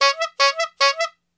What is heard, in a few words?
Horn Bicycle Squeeze